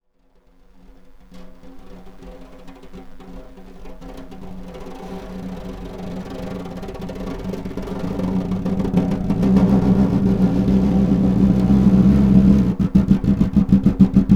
Snare Drum; Percussion Samples

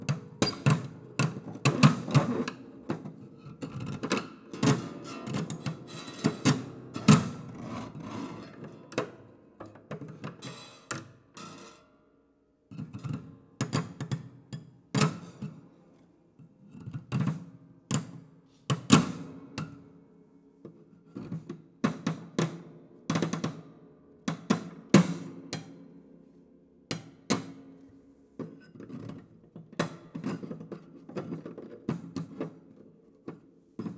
Plier Grind, Piano Destruction

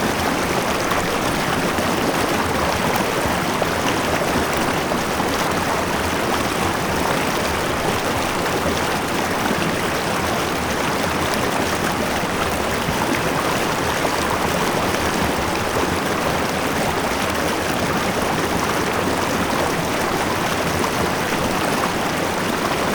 River, Static Microphone

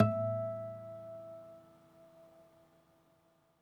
Harm, Classical Guitar

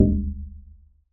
Plywood Sheet Soft Strike

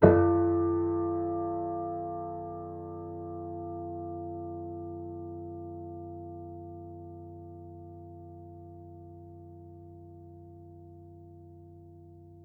Piano Harmonics, Harm Low Mid

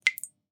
Drips

